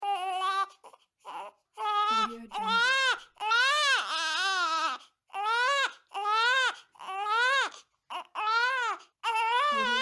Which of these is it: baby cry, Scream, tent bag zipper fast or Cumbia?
baby cry